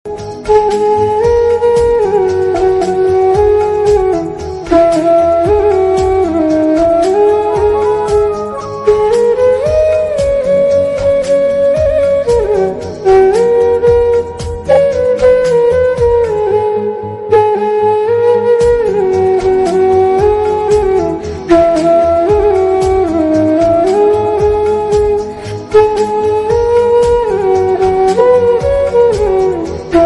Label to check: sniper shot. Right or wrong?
Wrong